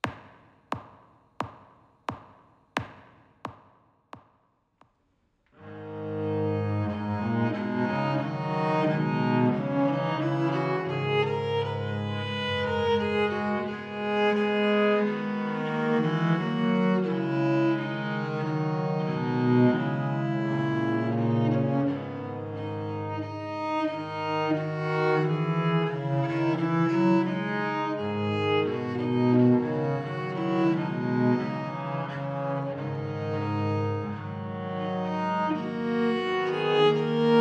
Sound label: Adieu Tenor, viol; C non Papa